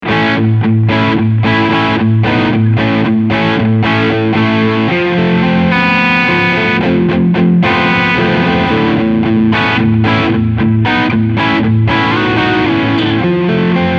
Overdrive EQ 12 Uhr

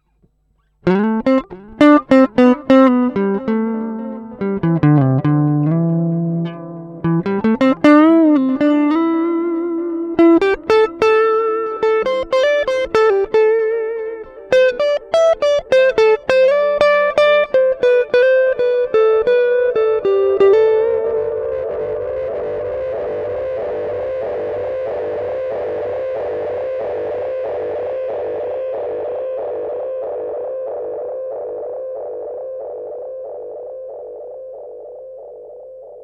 Plug In EP 34 Tape Echo

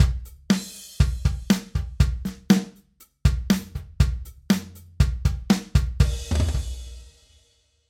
Drumloop Brick Drum Room

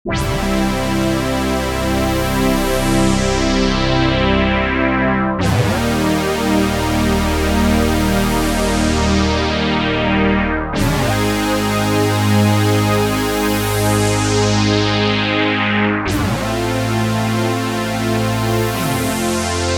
Sequential Prophet X; Analogia

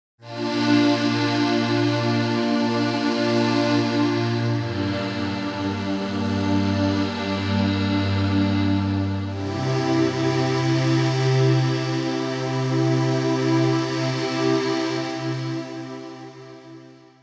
Vocalise Pads; Tranquilocity

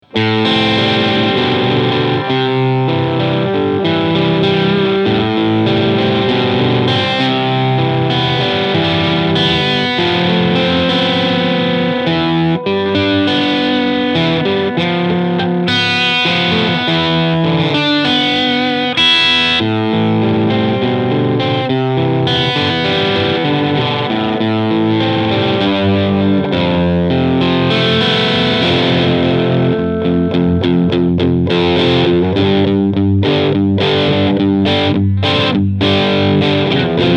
Crunch Sound, Treble down; Behringer HA-40R

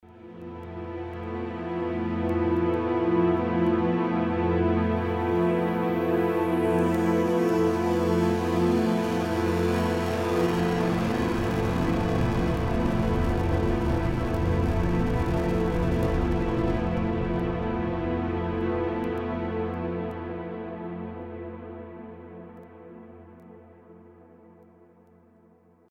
string orchestra bits; NI arkhis

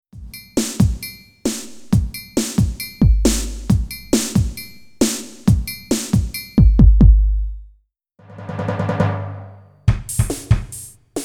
KAT Multipad Test

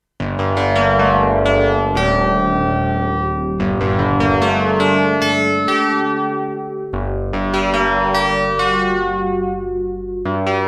Percussive

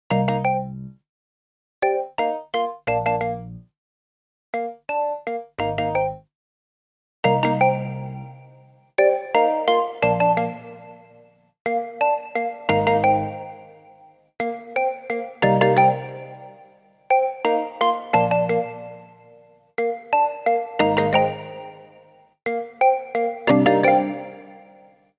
Clear Plate; Roland SRV-2000 VS

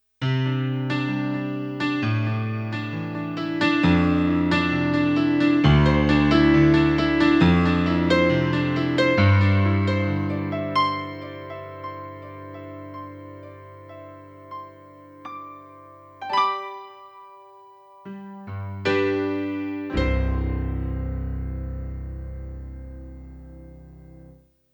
Piano

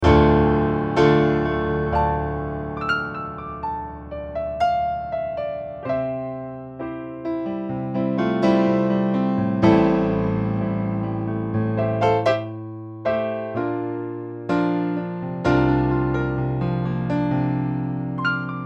MP6 Concert Grand

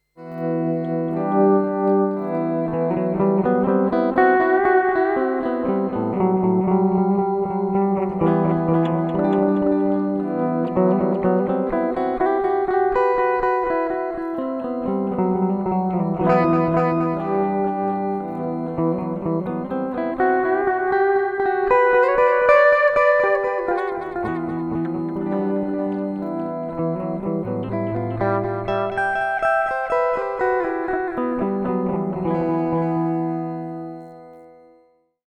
delay

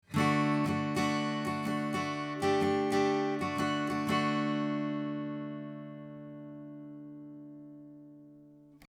Acoustic Guitar Off Axis 90 Degree; Austrian Audio OC818

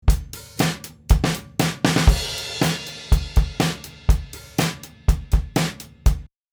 Zoom F8n Drums

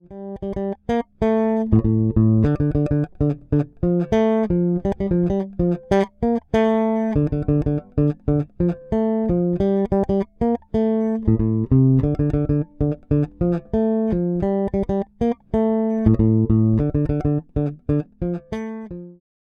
Jazzbass Bridge Pickup; Fodera NYC Empire

